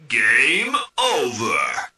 Game Over; SFA3

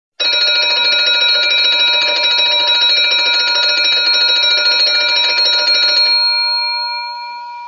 Alarm antique

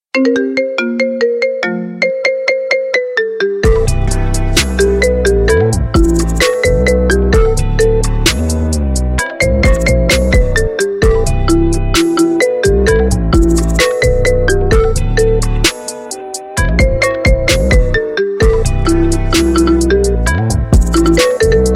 Marimba bumps

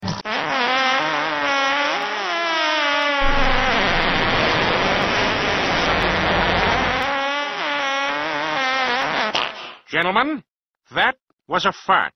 fart

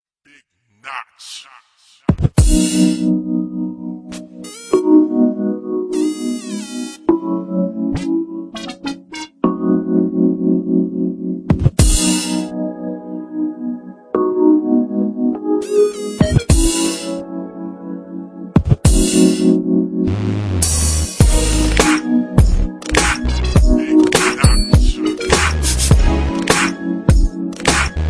westcoast beat g funk

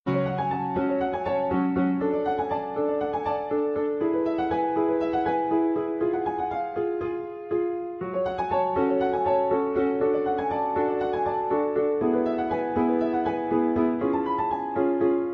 Piano

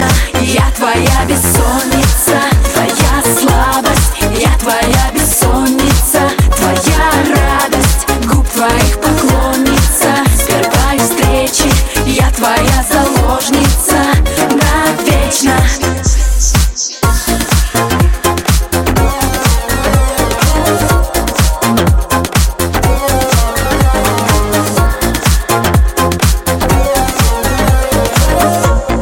electro dance, ringtones electronic

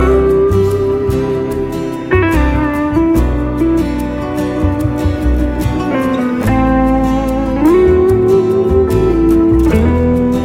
guitar

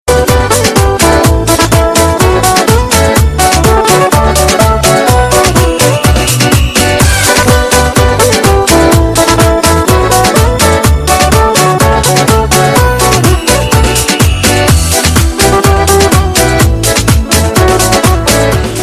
Romanian pop music

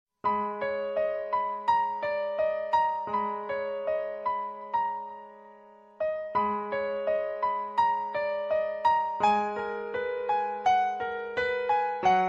Slow Muzk

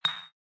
notification

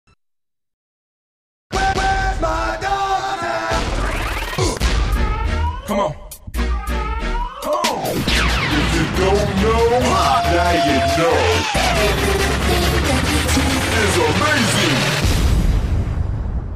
hmmmmmm; jazz

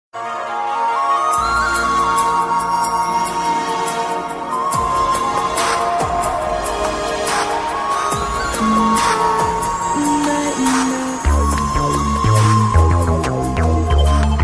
Blackberry Tone

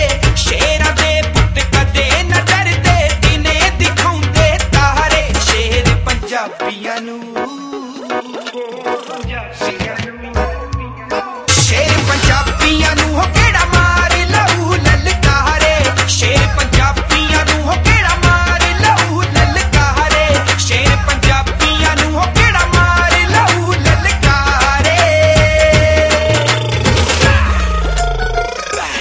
punjabi, ringtone; blues

